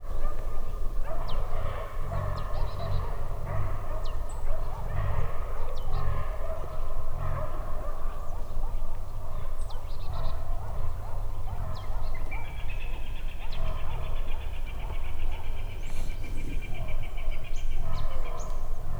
madár kutya, dámszarvas; termeszetben

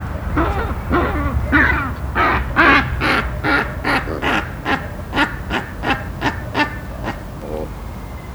normalisan szelvedo, galleros pavian; állathangok; nyíregyházi zoo 2010

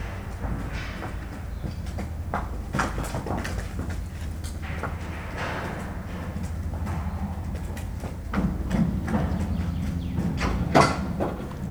esti szállásukra megérkeznek, zebra; szegedi zoo 2011

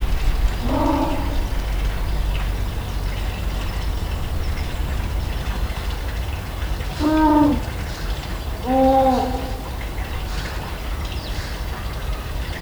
elaprozva, papaszemes pingvin; marantz puska; debreceni zoo 2012

